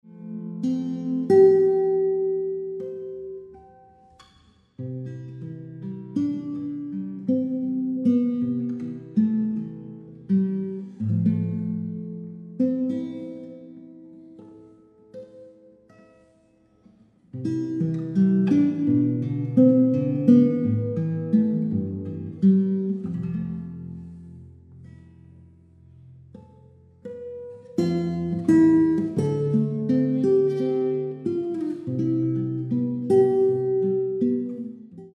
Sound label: Guitar Light Classical guitar